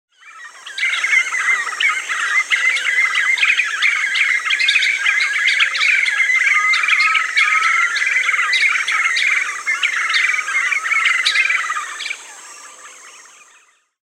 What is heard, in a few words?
Black-fronted Nunbird, Monasa, Nature's Songs, S. America; Monasa nigrif